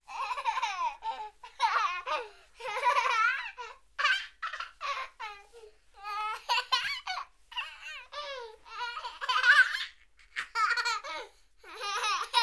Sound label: Kid Laughs Many Long; Poetry Project